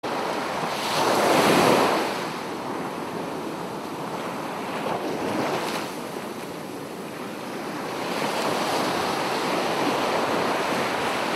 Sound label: Relax Sand and Sea Sounds of Nature Sleep Sounds; Relax 5 minutes of Sand and Sea